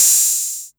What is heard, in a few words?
HiHat Open 808